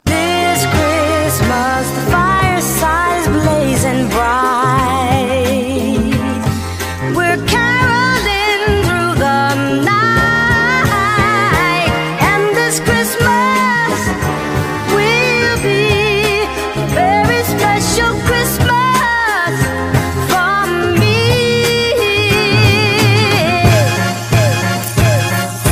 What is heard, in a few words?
Christmas Hip Hop Sample